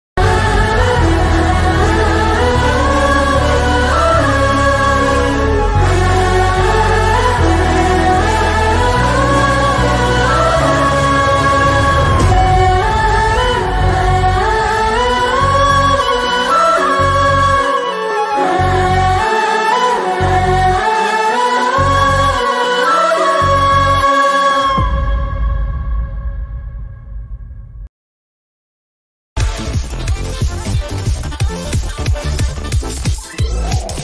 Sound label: dada emotional bgm ringtone, tamil; kavin; film